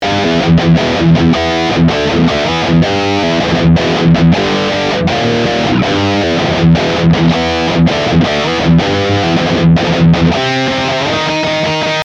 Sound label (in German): Riff Idee Mit Dist